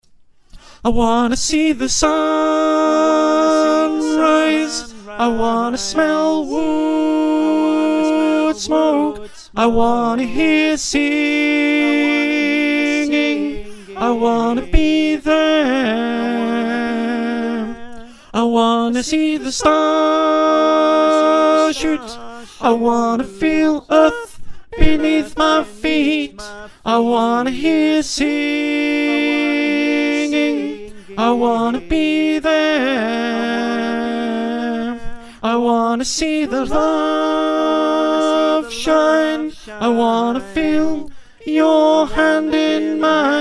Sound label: Tenor, choir